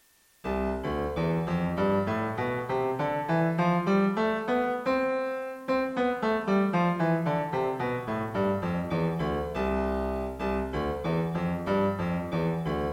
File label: D4 Classical Recording; Pianoteq